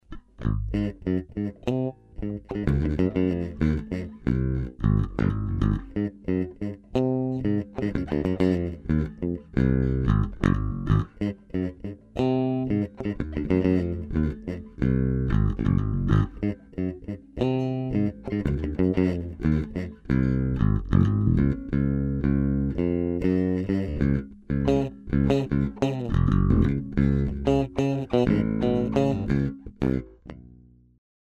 maple 76 G3 bridge pup, gibson bass

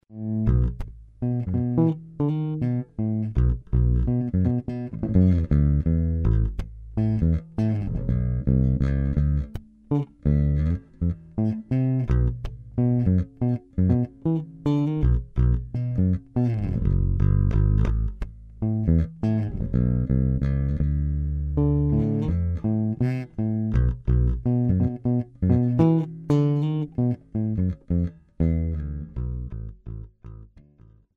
maple 76 g3 neck pup, bass

